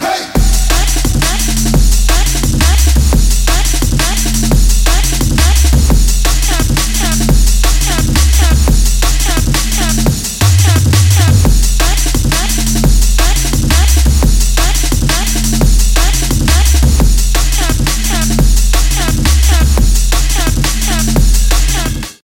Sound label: crazy drum and bass